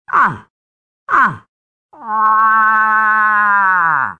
Various Moose

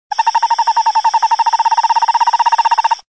Spring Screech Owl

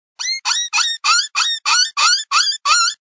Ky-Yi Distress

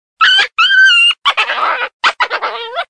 Coyote Pup Distress

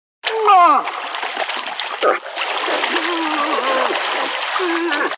Mating Moose

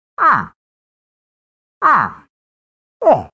Moose

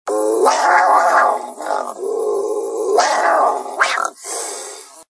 Mountain Lion

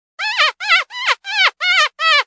Luring Lips

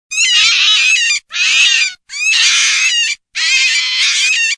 Red Fox and Cottontail

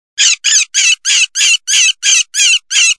High Pitch Cottontail

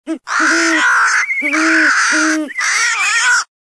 Great Horned Owl

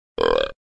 Young Buck & Doe

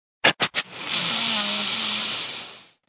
Snort Wheeze

